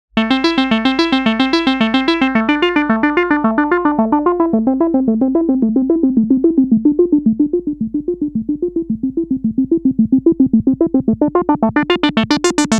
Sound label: Kawai S-100F LFO ENV mod; SLFO; ENV1 mod